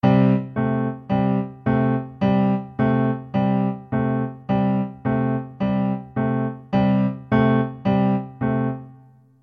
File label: Left hand 4 bars in C, piano blues